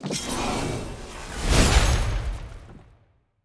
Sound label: Slash; Marie